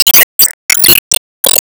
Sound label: Gimmick Breaked; disney star smash android ios mobile gamerip 2020